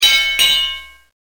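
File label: fight bell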